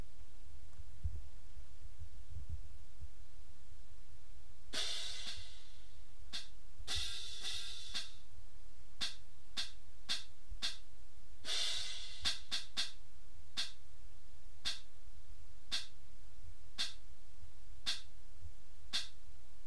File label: cymbals